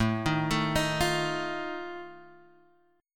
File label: F 7th A